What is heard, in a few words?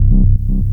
Menu Synth Tone